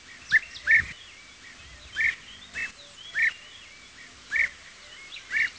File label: call, bee-eater, This call is uttered in different situation: when perched, in flight, in the colony near the nest and during migratory flight.; merops apiaster